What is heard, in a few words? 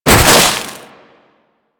Heat Gun Hit Effect; Grineer